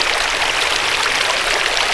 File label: stream; cstrike; dm malevolence